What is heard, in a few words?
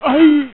wolf die